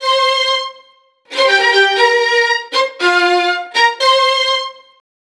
STRING LICKS, Disco

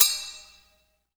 BELL, JAPANESE

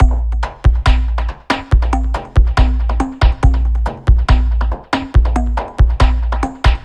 SloMo Beats, Loops 140 BPM

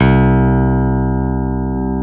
CP-80, Yamaha CP-80; Greytsounds VOL-1

